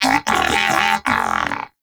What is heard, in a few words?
Moa Pet disagree; Warframe; Pets; Tenacious